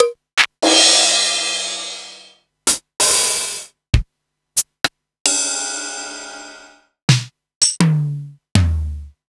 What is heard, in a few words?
Concrete DDD1